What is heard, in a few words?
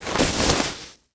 body roll; svencoop; paranoia